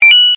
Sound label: alert coin